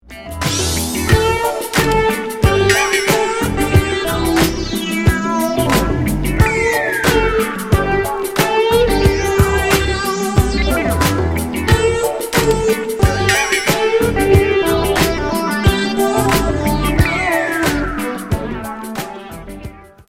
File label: Ringtone; SpaceConvoy; ringtone set 1